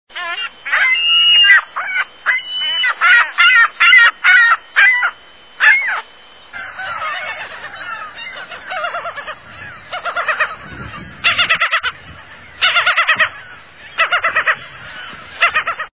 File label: Ring billed Gull, songs